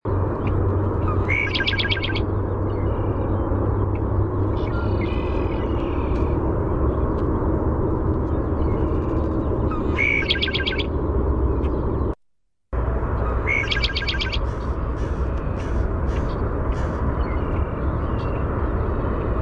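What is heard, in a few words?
Red-winged Blackbird song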